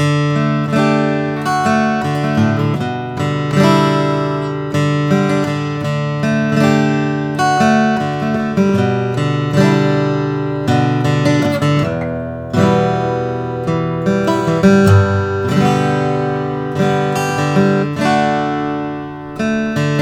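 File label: bourgeois oms adirondack 12 fret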